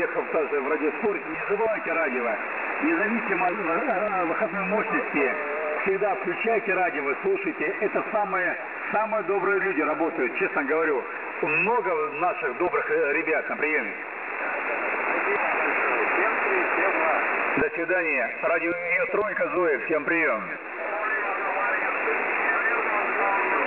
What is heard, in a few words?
websdr recording 3652.3kHz